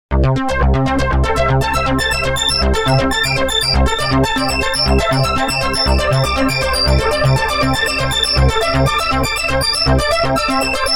Huge Delayed Arpeggio mono

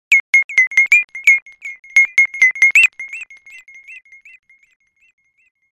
Funked Birdz

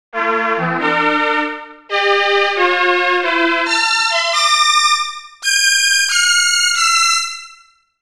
That String Track

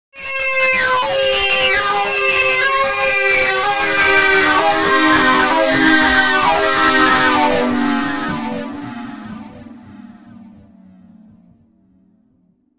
Thriller ATMOS

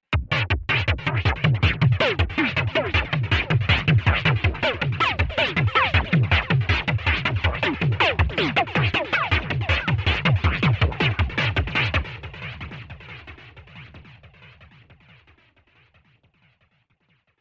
Add This To Breakbeat

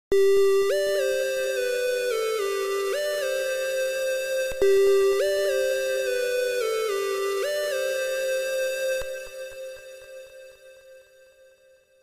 Melancholead

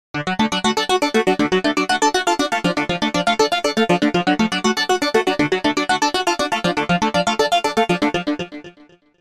I Love Arps

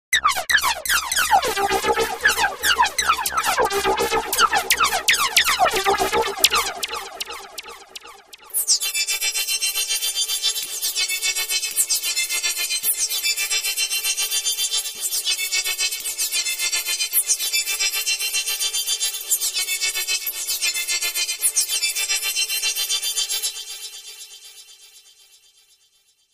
hardhouse Build

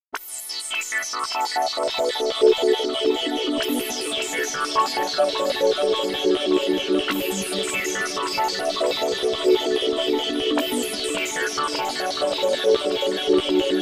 Trance a Oceanic Pad

